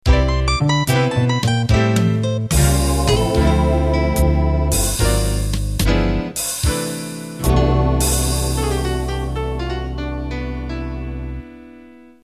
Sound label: Slow Jazz End